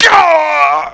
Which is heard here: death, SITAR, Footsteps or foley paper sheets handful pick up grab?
death